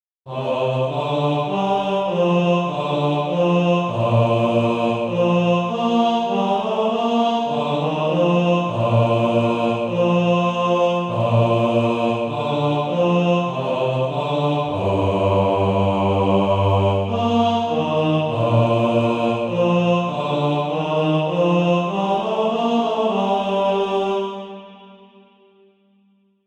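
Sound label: bass, Practise Music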